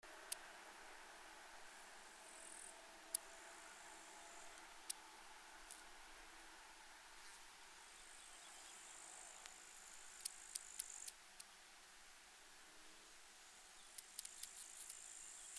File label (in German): Stenobothrus nigromaculatus 2 rivalisierende M; Thermenlinie